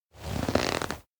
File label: grip handle tense; anemoi